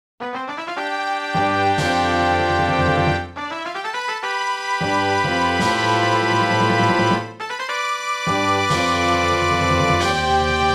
All Clear; Star Fox 64

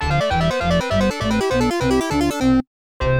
Die Tune; Spot Goes to Hollywood; Genesis

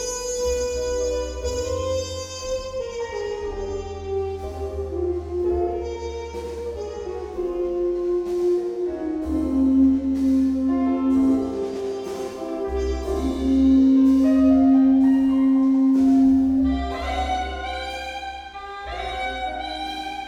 Midnight Jazz

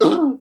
hit

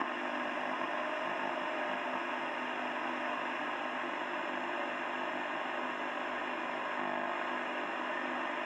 TV Static Aggressive; hot lava; ios macos windows

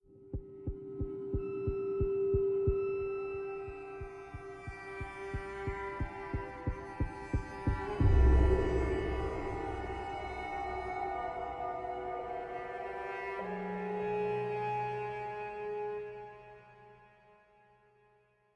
String; Assassin's Creed Unity

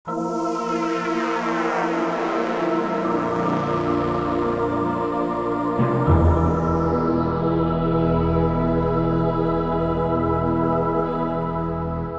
Logo; Take-Two Interactive

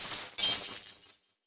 Ice

